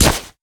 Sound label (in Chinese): 狼甲受损声